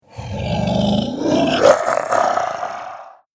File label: Dragon Beast, monster; horror